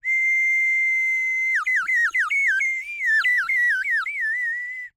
Bird whistle, clay, painted, water, airy, wispy, phrase, sustained, chirps, trail off, wandering; Eiravaein Works; Vaeyan IV